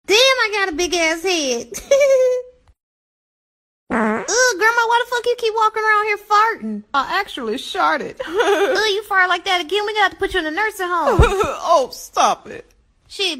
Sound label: grandma farts sound effect